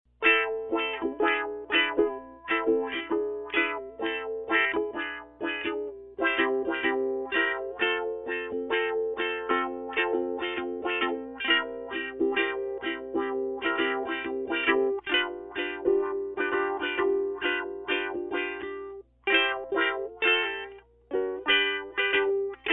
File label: hesitation wah